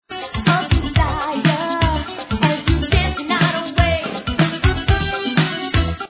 conga

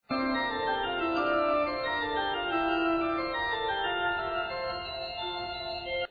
fugue d minor

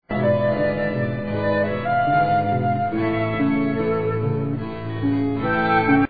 flute

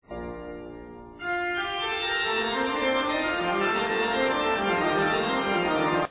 fugue d minor

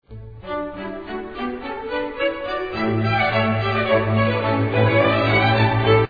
minuet

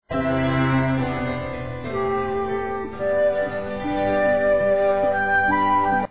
flute

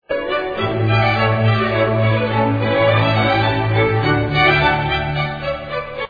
minuet

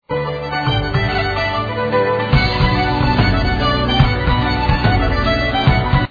duelling violins

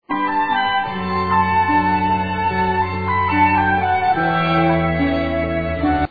flute

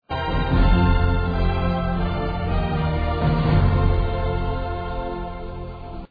leaving port

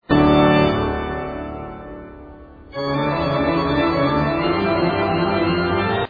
fugue d minor

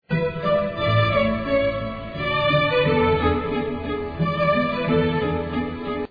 minuet

